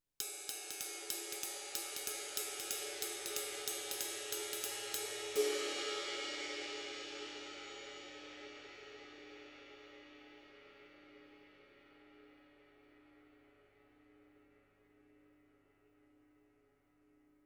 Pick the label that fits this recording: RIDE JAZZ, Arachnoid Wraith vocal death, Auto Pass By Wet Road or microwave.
RIDE JAZZ